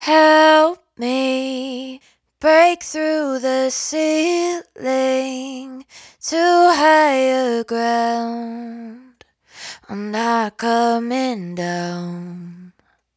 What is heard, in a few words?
Dry Vocals hook Not Coming Down 125 Fm; Raw Vocals; Slate Digital Omnivox Vocal Sample Pack